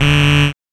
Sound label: Buzz Angry; FREE SFX PACK VOL 2